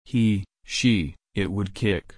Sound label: kick